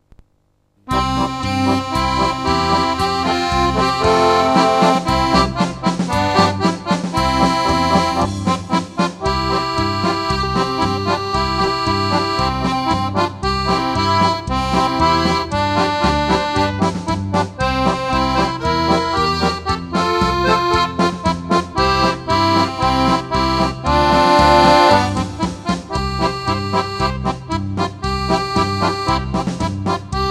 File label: Country